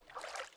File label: water; megaglest